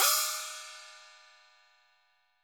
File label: SF GONG; Sampleheads; NYC Percussionworks; SF PERC VOL1